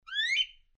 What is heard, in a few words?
cockatiel